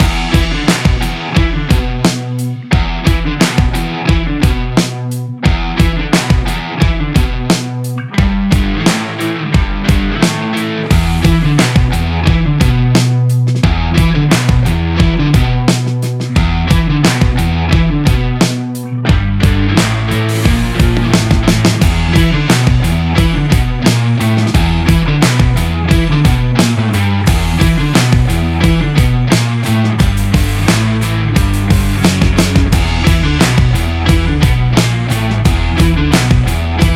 Rock Energy Loop

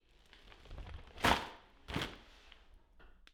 Dumping Garbage

